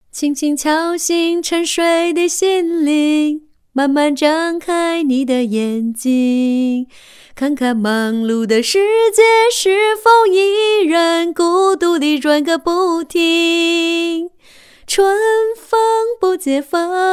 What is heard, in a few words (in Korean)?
중국여 노래